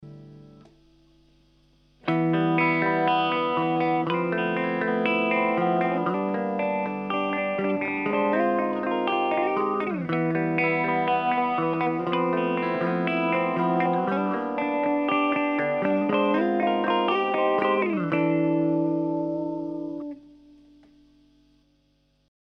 black truck mod

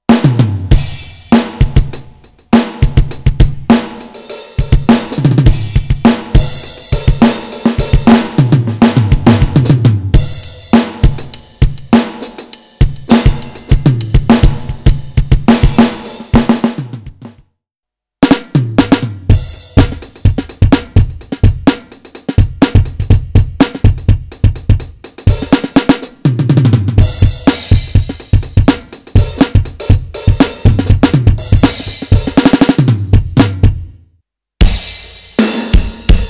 Millenium Test Drums

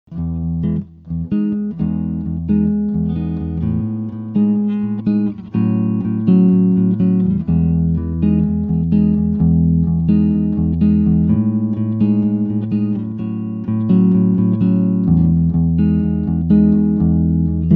maj voicings bridge Humbuck

